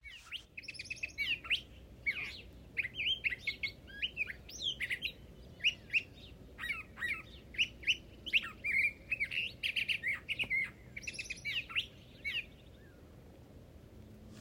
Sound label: curve billed thrasher